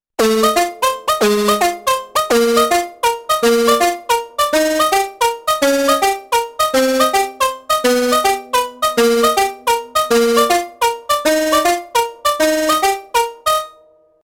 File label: OP-1 Digital